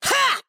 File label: Attack